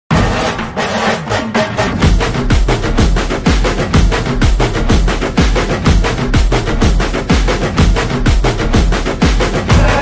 trailer bgm; mangalavaaram

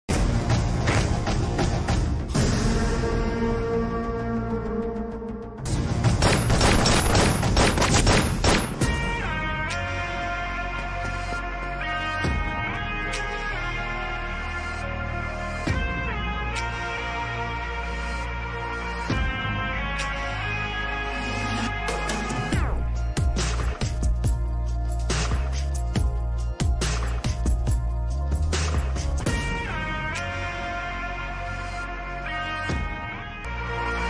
police training bgm; yashoda; samantha ruth prabhu; yashoda movie

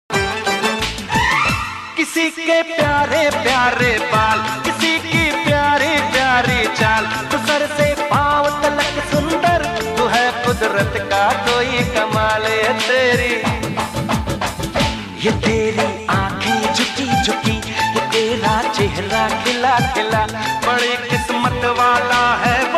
hindi song

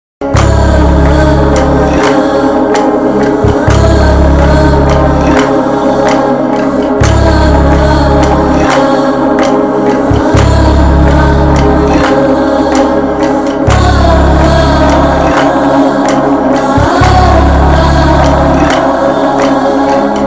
sad ringtone; veera simha reddy

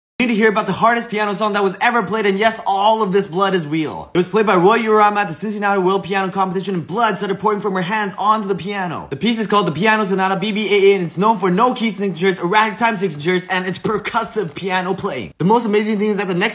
piano

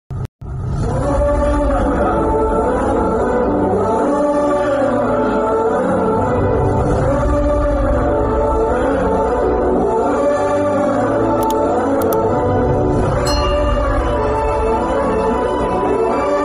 death bgm ringtone; veera simha reddy

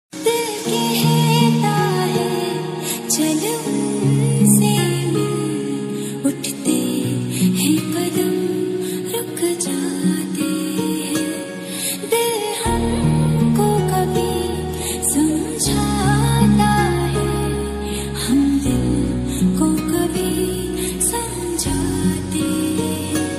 ringtone instrumental ringtone

